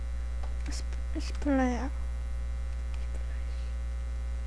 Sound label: splash